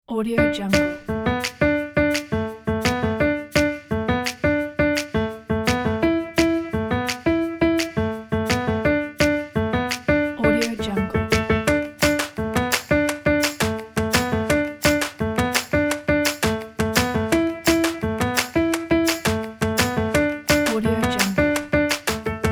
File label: upbeat happy pop music pack